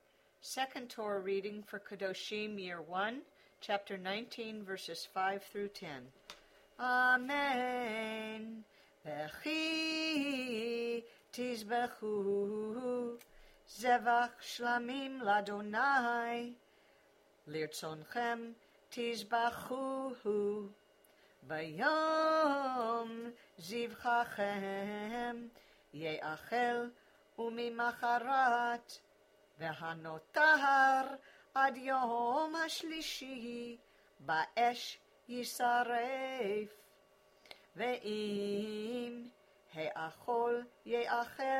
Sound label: Torah